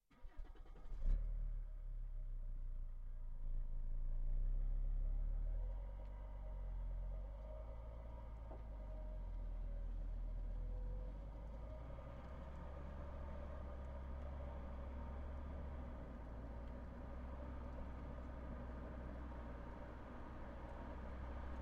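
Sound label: Volvo V70 Ambulance - onboard - MEDIUM - Drive Various - EXHAUST - Right; Volvo V70 2013; DPA4062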